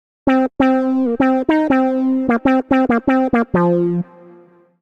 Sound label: Bb Minor 89bpm; MIDI Clips; Melodic; Evening Casual; Ableton; Factory Packs; Chop and Swing